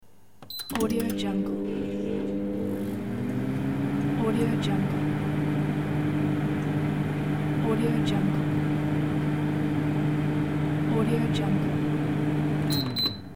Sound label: microwave cooking